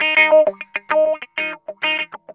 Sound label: GUITAR